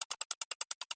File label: tick